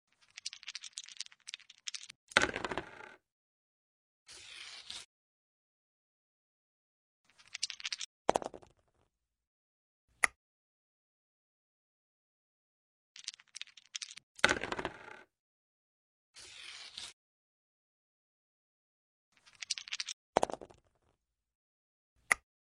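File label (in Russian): Нарды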